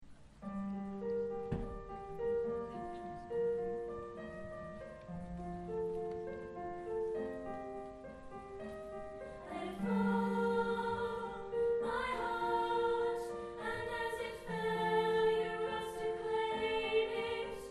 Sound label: coro